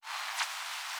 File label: static